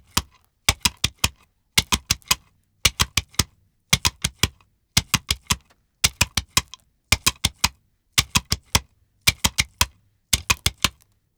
Hoof Rocks Gallop Step, Creature Footstep and Movement; Joshua Reinhardt; Ultimate Creature Footstep and Movement Library